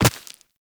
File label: Walk Rock, Footsteps; Operator